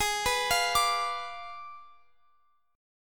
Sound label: Ab Diminished 7th Ab, Guitar, Open F; Abdim7; arpeggiated; x,x,20,18,20,21